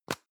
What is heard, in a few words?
plastic can lid screw open hard; objekte; dose